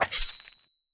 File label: Attack; Racaille